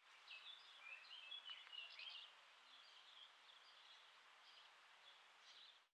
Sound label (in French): Arbre Lierre Oiseau Pépillement, Friche Industrielle Rhinau